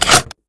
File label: rpg reload shove